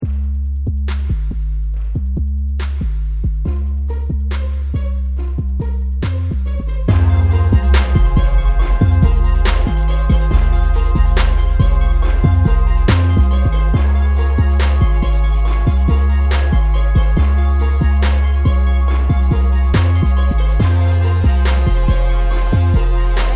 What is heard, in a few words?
instrumental